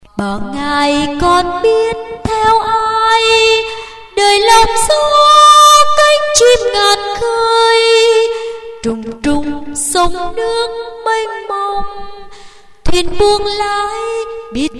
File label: tenor basso